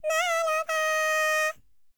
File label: HIMALAYAN, World Voices; ILIO; Vocal Planet VOL-5